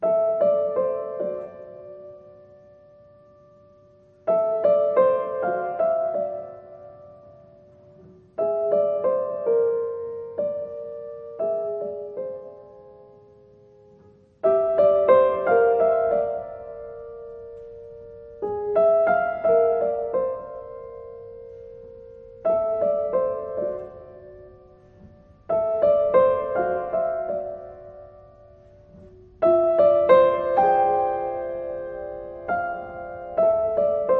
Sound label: Piano Piece